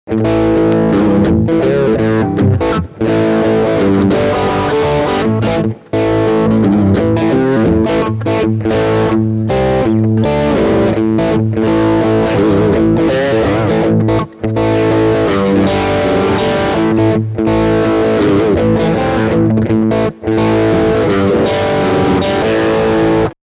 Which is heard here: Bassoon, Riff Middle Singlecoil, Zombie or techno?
Riff Middle Singlecoil